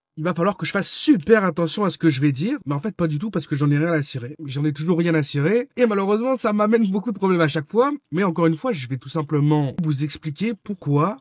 Vocals

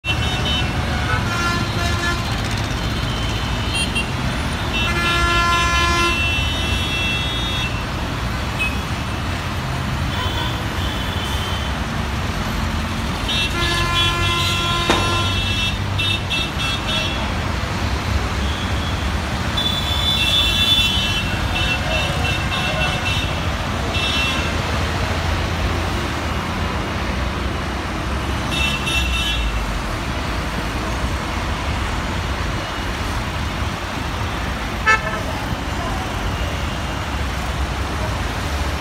traffic; background noise over speech

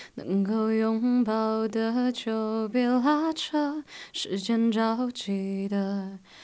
Alto

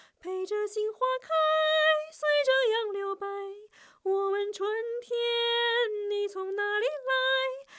Soprano

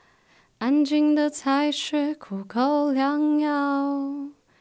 Alto

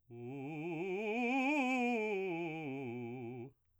scales c fast piano u, vocalset

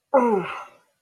Human sounds Human voice Grunt, vocal imitation

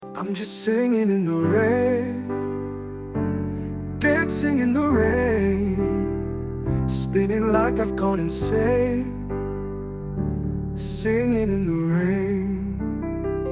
sing; Wan2.2-S2V

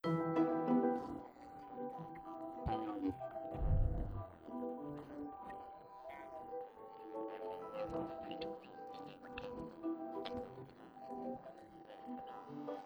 Instruments

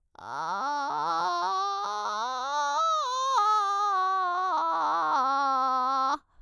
scales vocal fry a, vocalset

